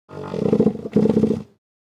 Sea lion grunt

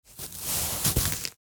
Burlap sack rustic scrape

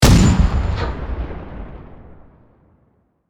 Armored tank cannon fire single shot

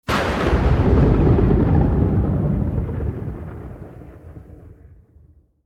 Dracula thunder strike vampire

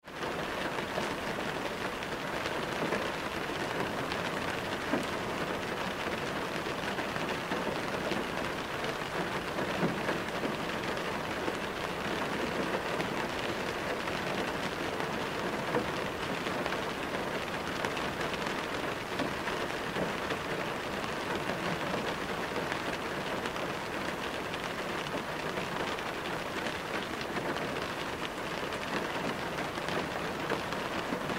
Rainfall car interior perspective

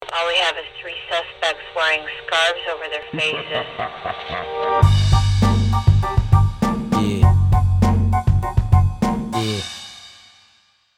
Busted thug life wasted police; social media

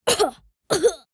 Kid heavy cough; gfxsounds

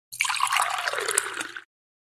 Liquid water pouring in a glass container

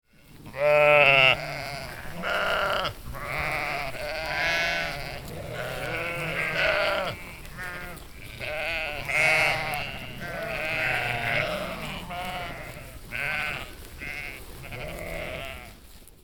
Flock of sheep bleating

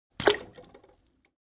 Pooping poop defecation ploppy drop

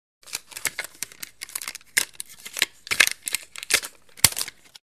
Scotch tape dispenser handling pull and tears

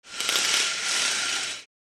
Horizontal blind raise up

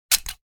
Silicone sealant gun single application; hand tool

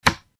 Dealing playing cards

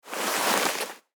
Fabric material cloth shuffling

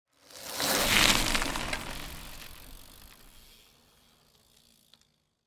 Bicycle dirt bike pass by fast speed

